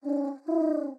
Bird Cicada Notification Midday; Xiaomi MIUI 11

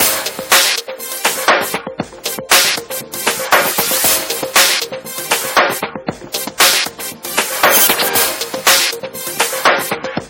breeze; Lenovo; P780; Aveeno

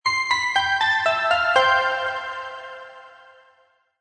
Power off, phone

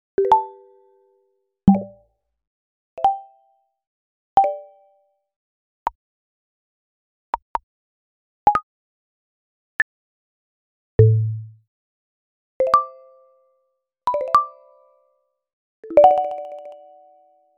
UI Sound Set for Bluetooth Speaker; Nokia; Aleksi Eeben Demo 2018

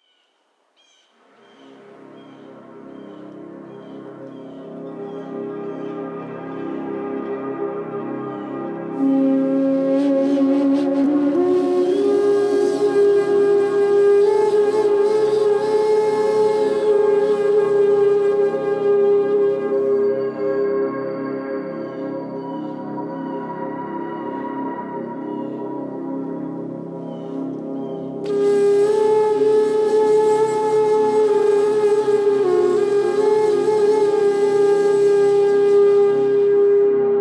Distant Shore, alarms

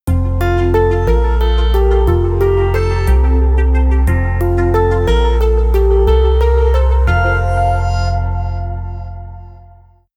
Stereo Soothing, ringtones; Lenovo; ZUI 13

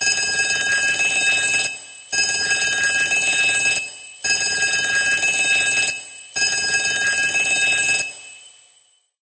mechanical bell, alarms; Sony Xperia X

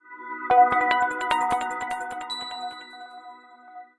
Power On; Samsung Galaxy-A8

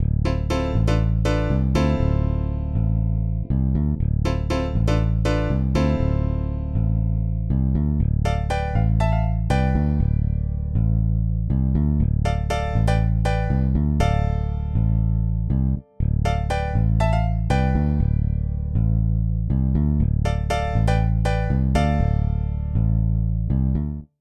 piano, ringtones; OnePlus; OnePlus 5

